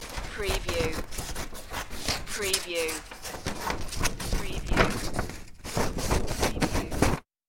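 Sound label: Messy Scissor Cut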